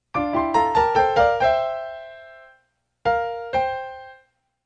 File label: Terrible Counterpoint